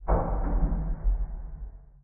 structure blunt; Barotrauma; Subsurface; Damage

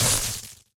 shield hit; Barotraumatic

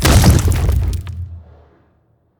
spike explode; Barotrauma; Barotraumatic; Husknyx

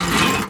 metal run, Footsteps; Thalassophobia Restored

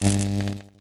stunbaton, Weapons; Barotrauma; Subsurface

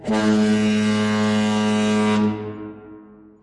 bus horn; Barotrauma; Barotraumatic

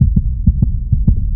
heartbeat; Barotraumatic

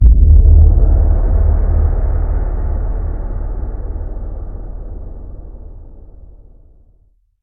Pylon far; Barotraumatic

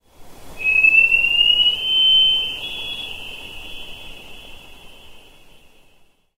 Sonar Sweep; Barotraumatic; Carrier Leviathan

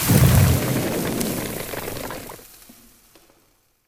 acid explode; Barotraumatic; Molochgreen

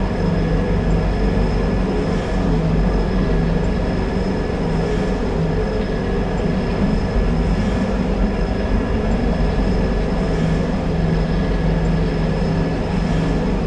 reactor hellburn small; AA Dangerous Meltdown - EK Compatible

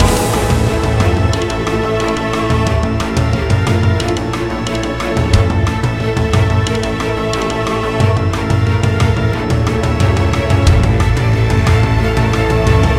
Rock; Barotrauma; DynamicEuropa

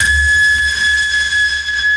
sonar Ping Water Medium Shuttle Directional; Barotrauma; Real Sonar

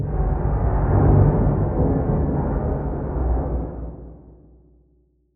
hull damage; Barotrauma; Barotraumatic; Hermits

